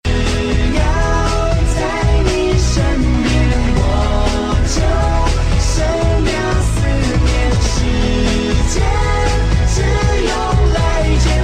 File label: Romantic Princess